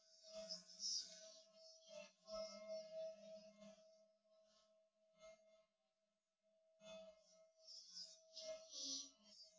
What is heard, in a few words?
test nodrums synthconv; SiSEC10; underdetermined